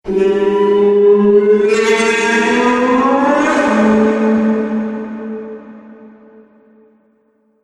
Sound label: Ghostly Synthetic Moans; Andrew Fleming